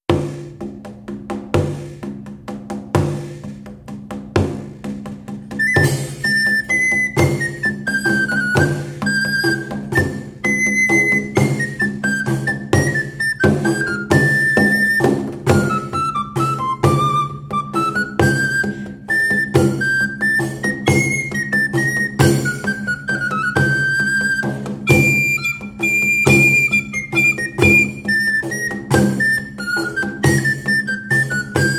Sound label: BasseDanse; Goliards